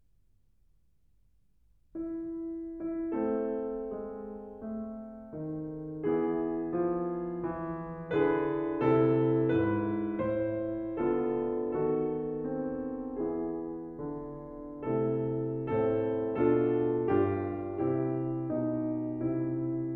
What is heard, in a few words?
Small Piano Pieces, Molto Adagio religioso; Grieg; The Complete Music For Piano